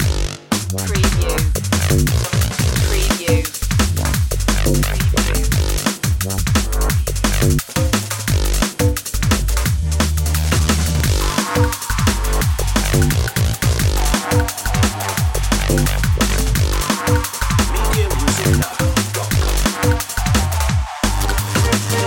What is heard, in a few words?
danger dnb loop